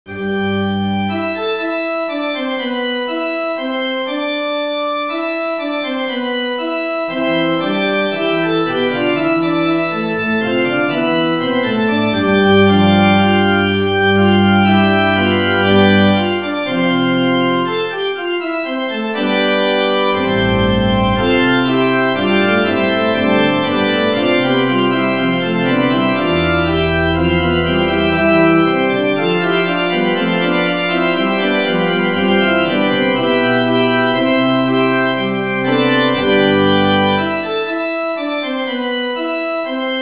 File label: organ